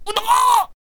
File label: Spear Thrower Death Vocals